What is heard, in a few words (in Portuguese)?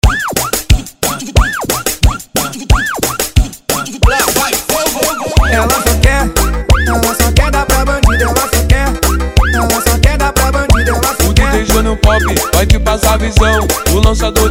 VERSÃO TECNO